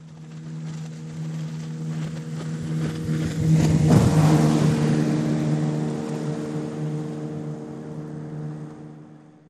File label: 50 hp Evinrude Boat Pass By, Fast